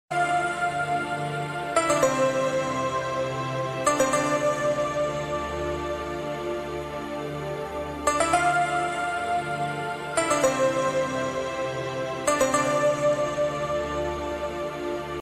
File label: soft alarm ringtone